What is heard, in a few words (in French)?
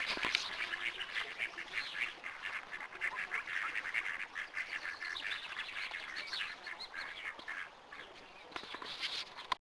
canard